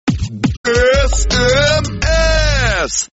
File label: sms Rap